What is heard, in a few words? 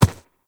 Ram Step Walk Dirt; Animals; Duviri